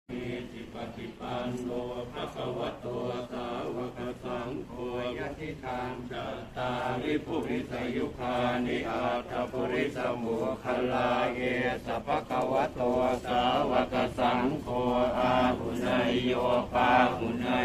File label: Buddhist hymn; سرود بودایی